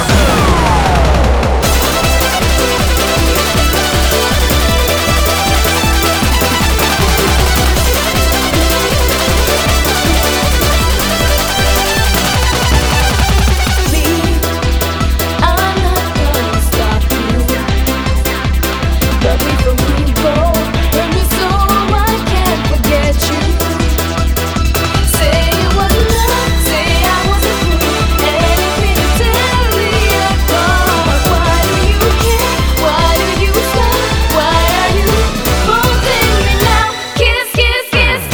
super euro version; Para Para Paradise

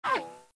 rifle hit sound; Arc of Templar

